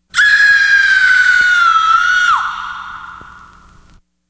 scream

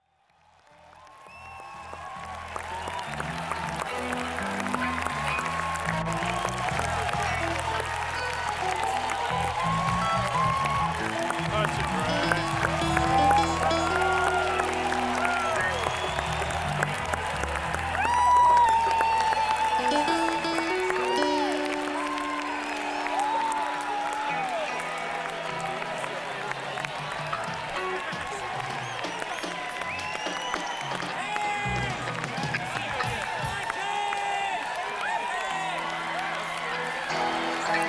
Crowd Tuning

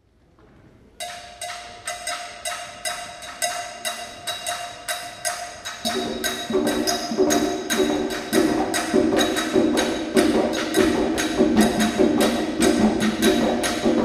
ensemble bembe

